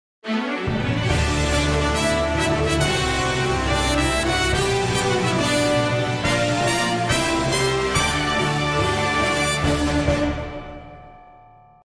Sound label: Victory Fanfare; Earth Defense Force 5